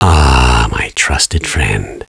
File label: weapon